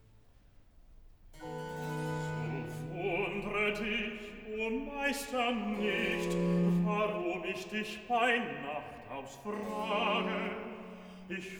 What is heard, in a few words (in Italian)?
Recitativo Bass; So wundre dich, o Meister, nicht; Bach; cantatas; Es ist ein trotzig und verzagt Ding